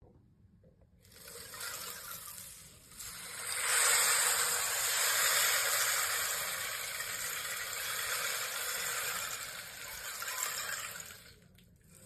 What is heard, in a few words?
River Drum; river drums 8; purple dolphin river drums 8